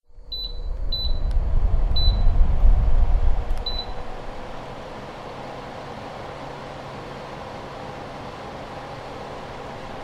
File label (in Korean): 선풍기